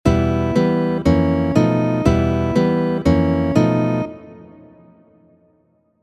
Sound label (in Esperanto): Alia studo pri akordoj. Estas tre klara influo de argentina tango en siaj harmonioj; malmulte alegra); Studo 6-a; mi minora